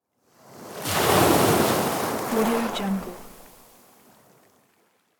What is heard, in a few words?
stormy sea wave